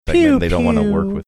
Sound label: making ray gun like air horn sounds